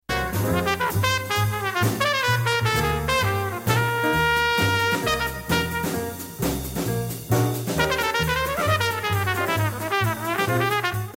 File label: doubletime start